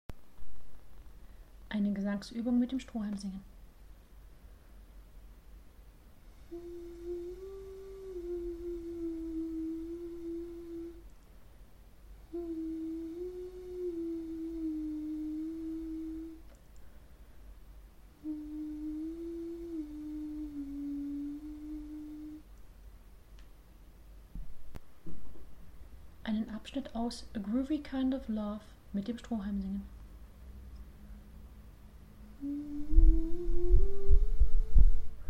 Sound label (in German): Kopfstimme Mit dem Strohhalm singen; Kopfstimme finden